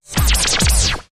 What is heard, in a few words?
Swishy Thumper Groove